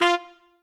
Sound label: Trumpet melo